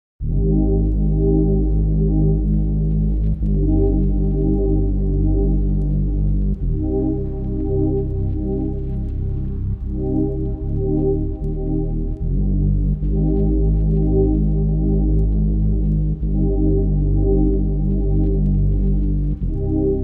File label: Chords Warm